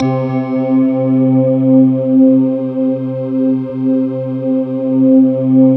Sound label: bell choir, slow bell choir